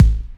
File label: DR. LOOP, DRUMS; X-Static Goldmine 3